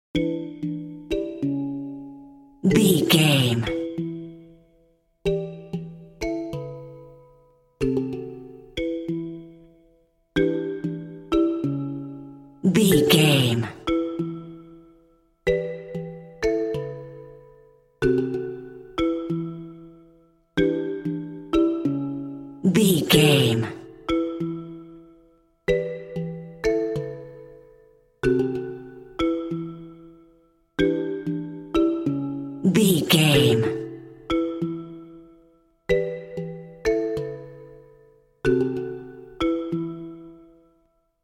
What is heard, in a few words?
poignant marimba